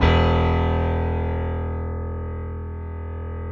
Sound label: PIA E 1; Best Service Gigapack 1